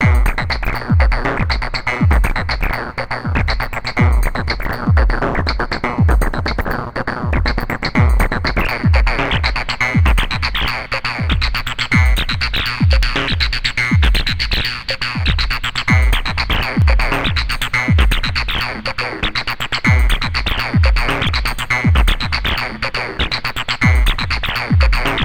placid acid; weekly beats 2020

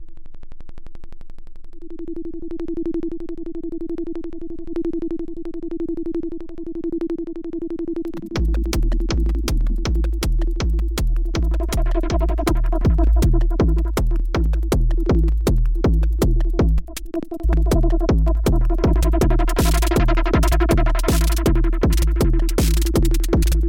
propper not techno

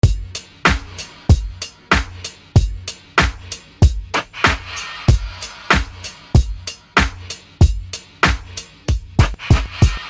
groove stuff

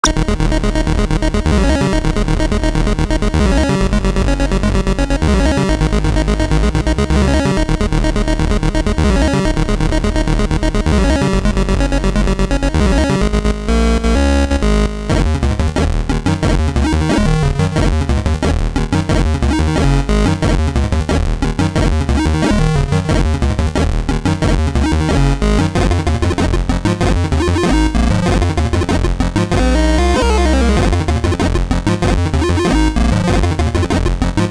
chip test semi atonal; weeklybeats-2016

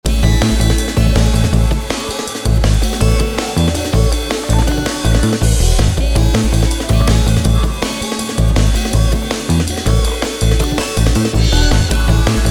drum pig

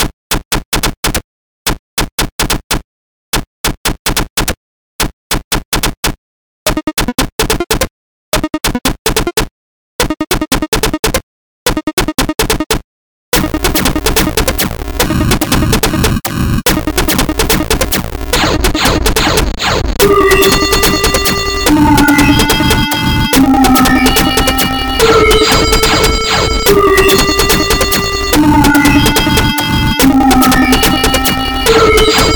entropic alien horn

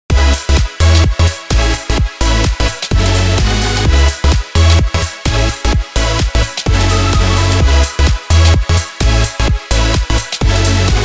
birdcall; weeklybeats-2020

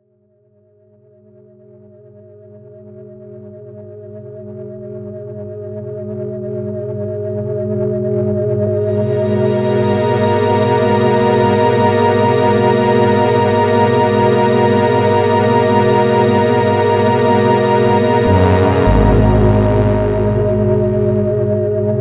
ambient sketch; weeklybeats-2020

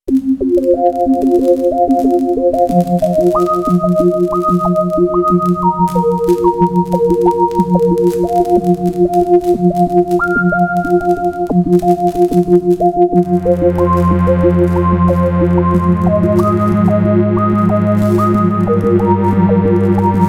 four voltages

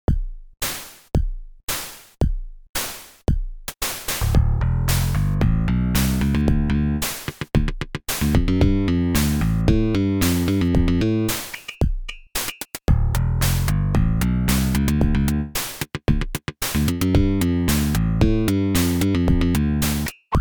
funk something opl3; weeklybeats 2026

